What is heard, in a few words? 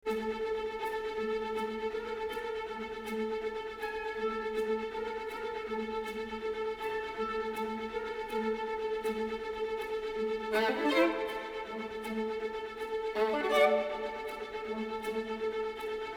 Scherzo for Violin and Swords